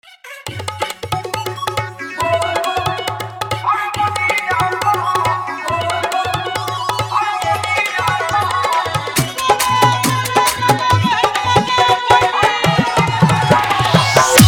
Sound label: Bobam Dhollki Bass Dance Mix